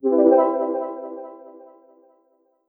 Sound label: startup; LNXBOX